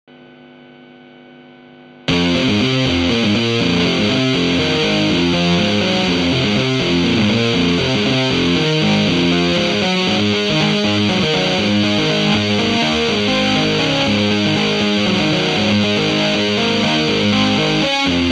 ES6 CLEAN METAL MUTE

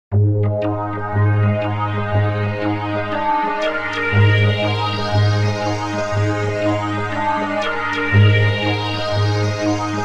SWEEPUP SYNTH

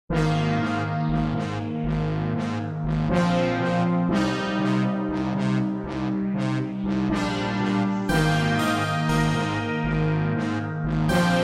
K3 EMULATOR

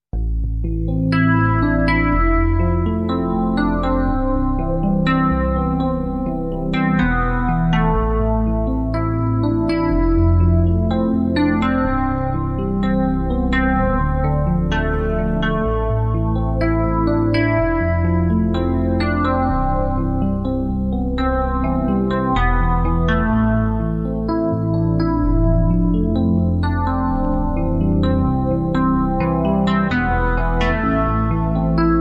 PLG150DX MY E PIANO

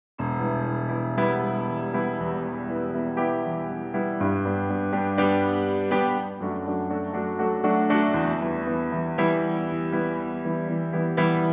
PIANO FORTE

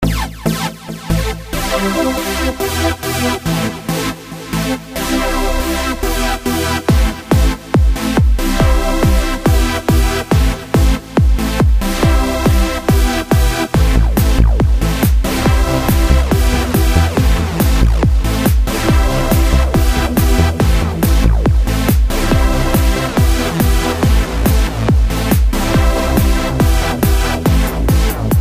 SUPERWAVE TRANCE

